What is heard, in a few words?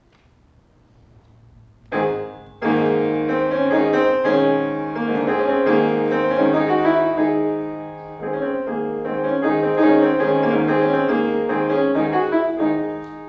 Mazurka in G#; Clifton Hughes; Concert Christchurch